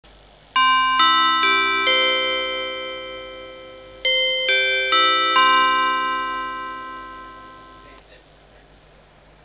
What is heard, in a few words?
chime; hokkaido